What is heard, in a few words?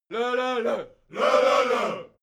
crowd musical bawling